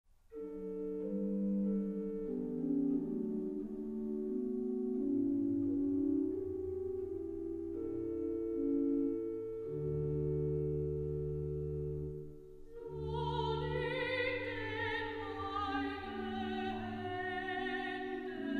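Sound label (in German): soprano; trauermusik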